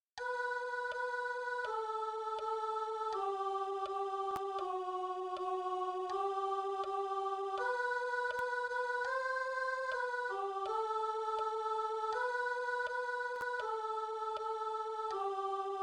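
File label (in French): Approchons-nous, soprano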